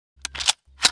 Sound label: m1 reload, weapons foley; MacFormat 2003 June; Spearhead Demo